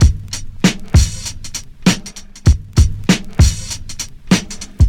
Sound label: 98 bpm breakbeat g key, Free breakbeat - kick tuned to the G note. Loudest frequency: 1156Hz